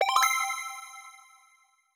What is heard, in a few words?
win